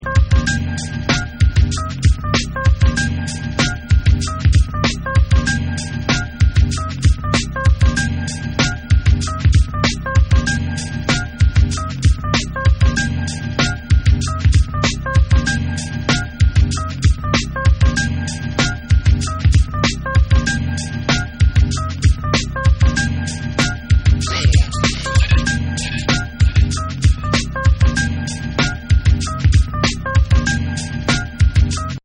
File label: Instrumental